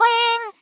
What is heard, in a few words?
Boing; Teto; SM64 CO-OP; Triple Baka Pack